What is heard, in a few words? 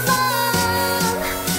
blub